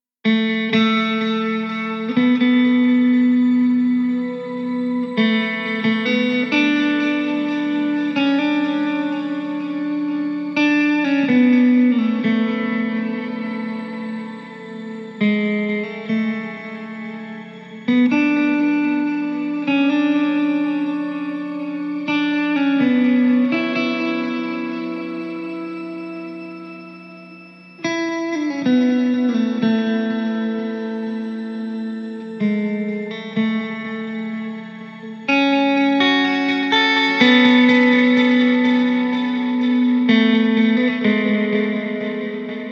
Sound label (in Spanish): Guitarra Aislada; Gladiator